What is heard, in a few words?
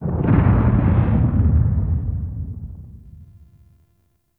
Sentient Vessel Step Delay, Enemies; Warframe; Tau; Movement